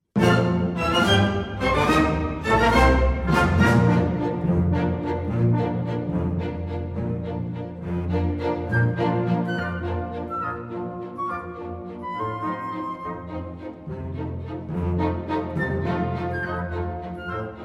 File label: piano